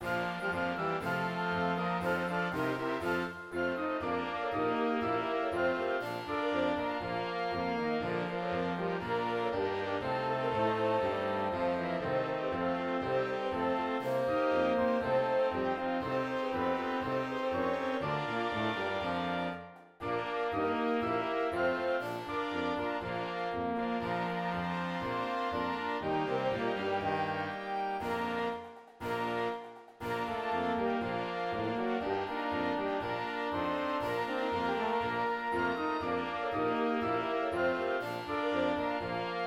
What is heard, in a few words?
school fight song; martin school